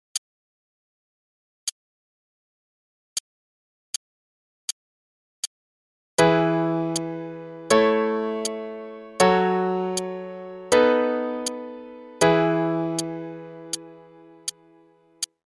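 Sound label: E Major Chord Progression only (quarter note=80); CM Level 5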